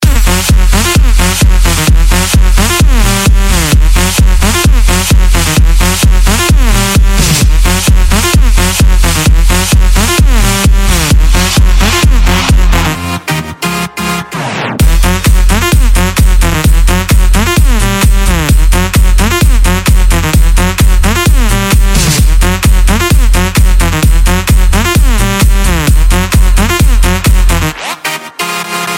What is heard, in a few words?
Club Base Music